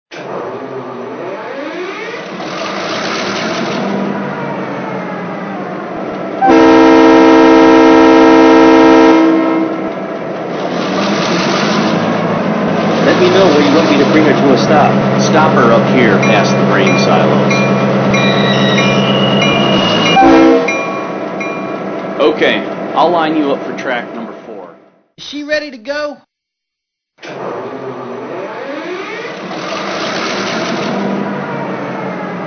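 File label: MP15AC, locomotive; SOO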